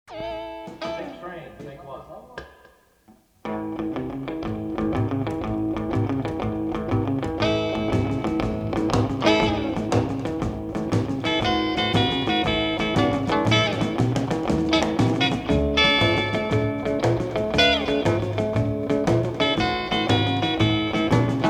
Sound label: big train instrumental